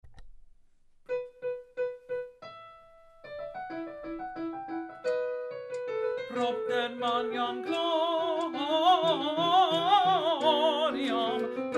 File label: Fast Tenor; Propter Magnam-Fast; Choral Resources